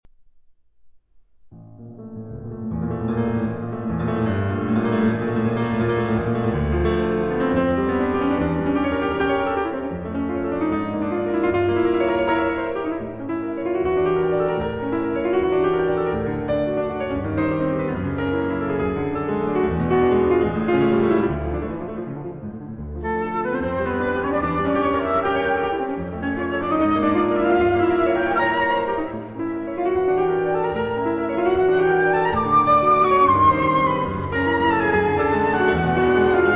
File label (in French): Sonate Pour Violon et Piano en La Majeur (Arrangée Pour Flûte et Piano); FWV. 8; II. Allegro